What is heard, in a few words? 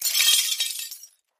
Glass Debris